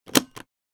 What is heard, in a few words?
Briefcase Latch Closing; Dr. Sound FX